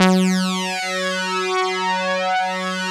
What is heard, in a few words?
DS8 F#2; Korg; Back In Time Records; Korg Universe VOL-1